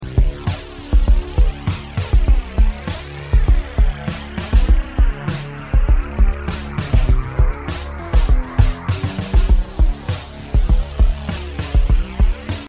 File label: ringtone